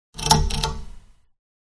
Pull chain light switch; SOUND EFFECTS1

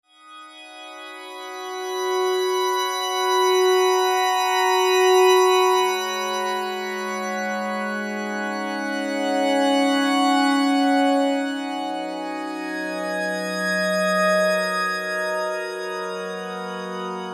FM Melodic; Beds

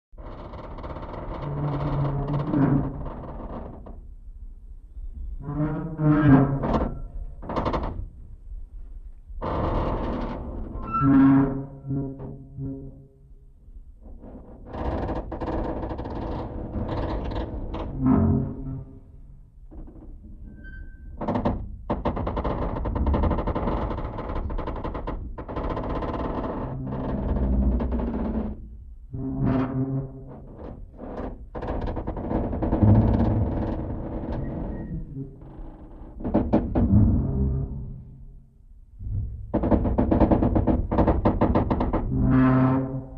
Metal Creaks & Groans Low End & Slowed Down; Hollywood Edge Foley Sound Library

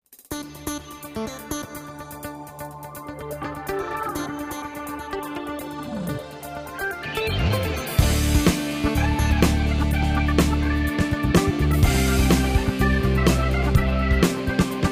clean gtr full rocker pwr lead

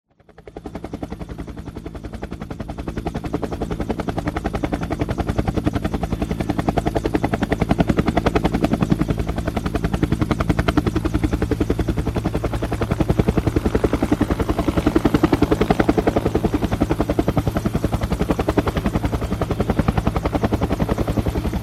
Helicopter Hover; SOUND EFFECTS1

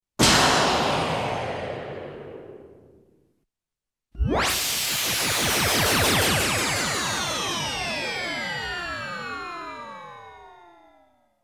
POWERSHOT

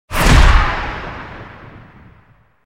Eerie Fast Big Flash; Hollywood Edge Sound Designers Tool Kit

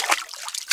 WATER, FOOTSTEPS; 1000fx

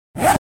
Zipper

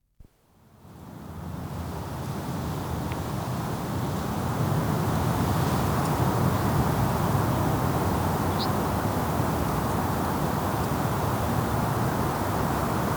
Driving Rain Storm and Strong Winds; Dr. Sound Effects; 210 Nature Sounds - 20 Hours of Relaxing Natural Ambiences for Meditation and Sleep

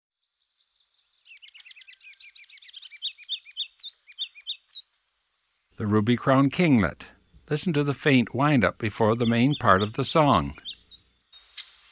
Ruby-Crowned Kinglet; Eastern North America; Dan Gibson; Wildlife Identification By Sound

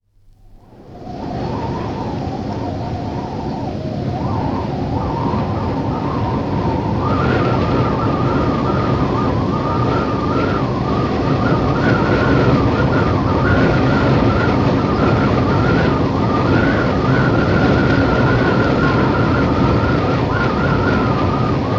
Desert Winds; Dr. Sound Effects; 210 Nature Sounds - 20 Hours of Relaxing Natural Ambiences for Meditation and Sleep

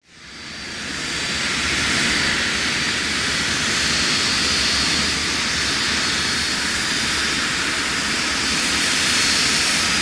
Jet Large Exterior Perspective Take Off & Away; Planes & Trains

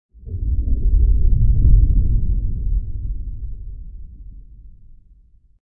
Sub woofer - Short bubble rumble; Hollywood Edge Sound Designers Tool Kit

